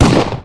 ATTACK GUN L

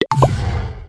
totem speed down

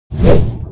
ATTACK

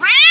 BE ATTACKED

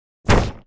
jump

